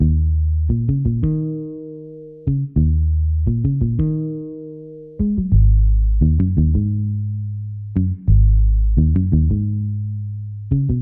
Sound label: Bpm87 Eb Italian Library Bass